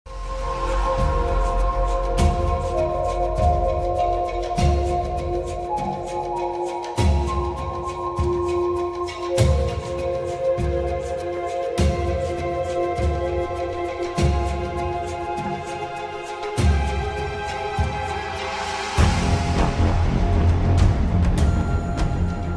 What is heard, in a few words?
Jungle Battle Music; ARK Survival Evolved